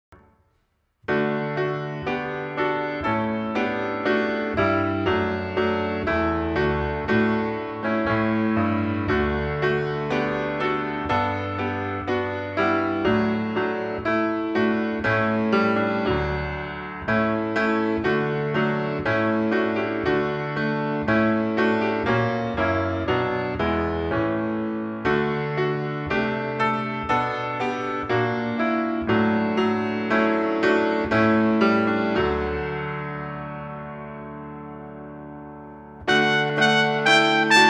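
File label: Ode To Joy piano trumpet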